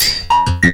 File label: noise, FX & ATMO, ALARMS & ALERTS; Techno Trance; Techno Trance Essentials